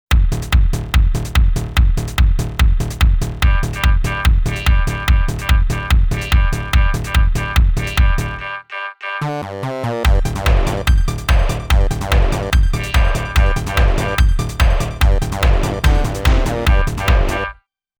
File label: techno; Multi-patch demo 1; xp-80; roland demo cd